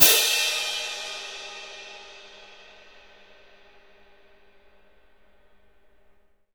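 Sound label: cymbal, Orchestral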